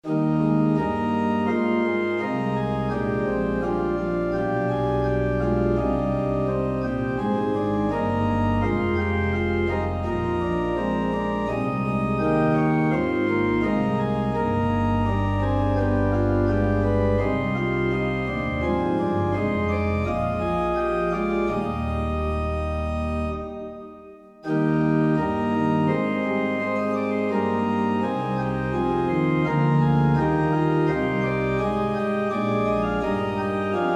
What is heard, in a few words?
organ solo for trp training